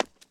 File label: concrete step; Golden Crayon Sounds